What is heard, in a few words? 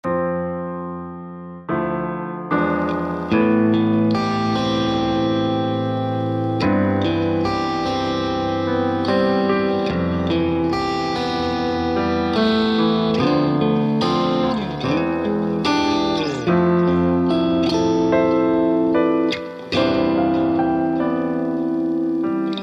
guitar